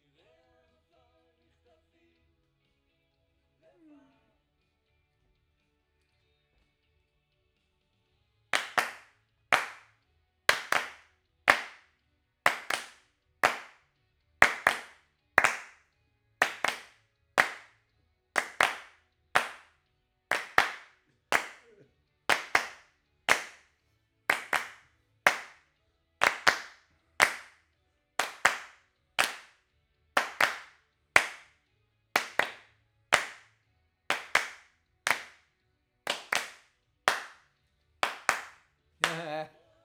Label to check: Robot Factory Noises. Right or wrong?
Wrong